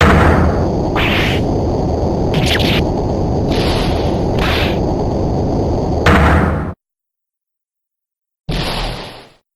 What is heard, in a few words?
breakdown; payne